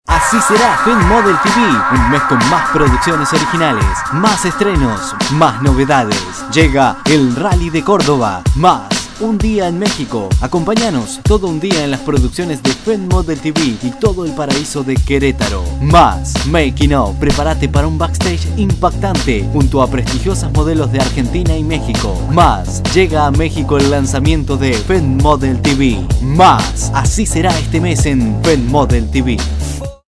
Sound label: ringtone